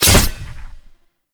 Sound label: morph slash; Warframe; Khora